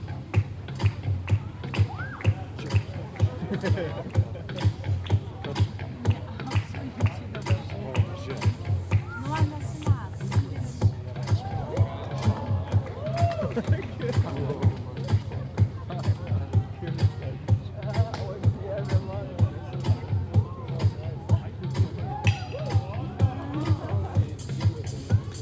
vilnius spectacle cirque

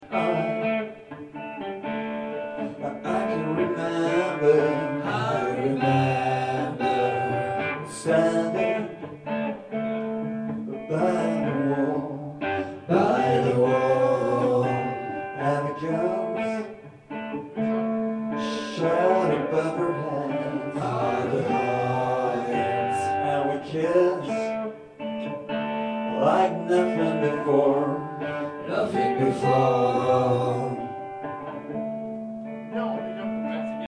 choeurs voix plus basse

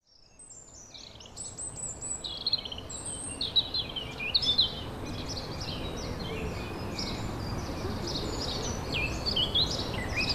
Oiseaux bois; MZ-N710; le Carre Rochet

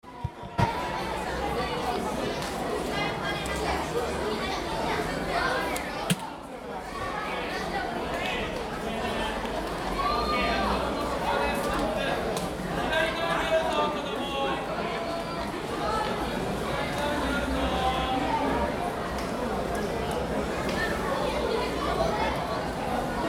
ambiance rue

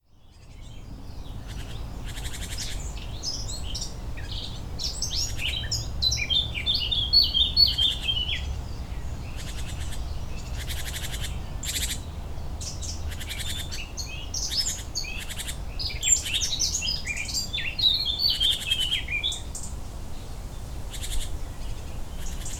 oiseaux; 5 oiseaux beaumonts

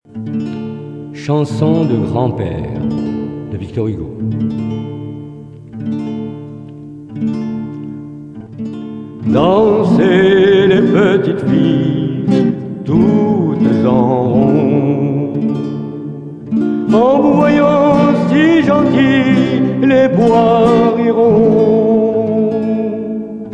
chanson de grand pere

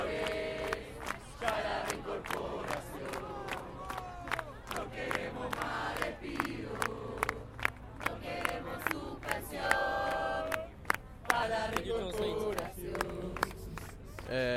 Chant manifestation

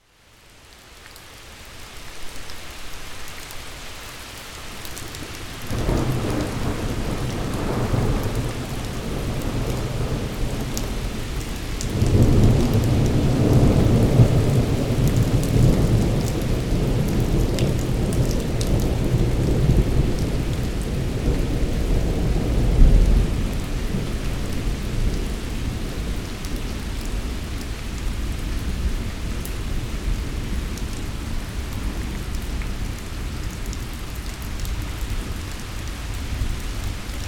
orage

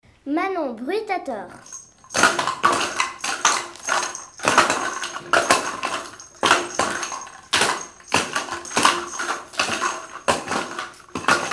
bruitator

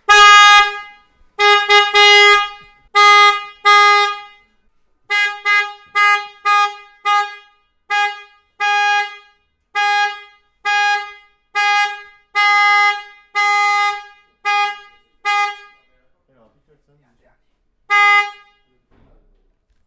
klaxon harley davidson

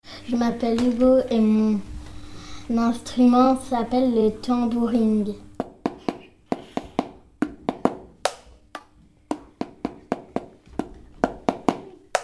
le tambouring

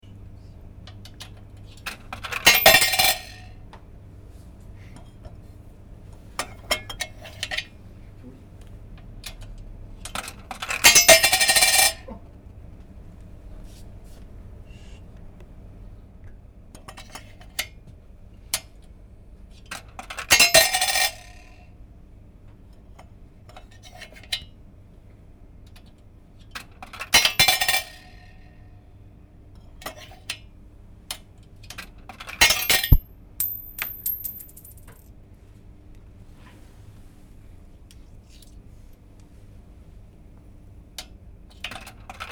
distributeur laverie piece monnaie

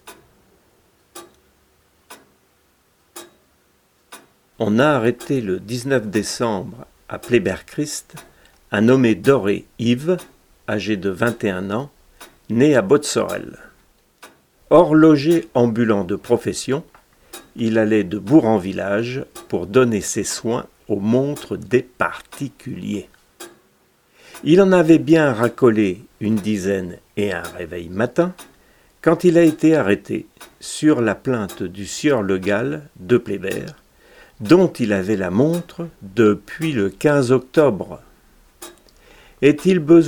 les 12 coups de ma comtoise

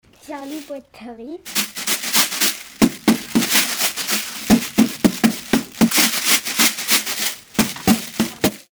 boite a riz

